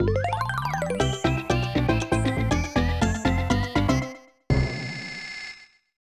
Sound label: Good News Jingle, snes; Wally wo Sagase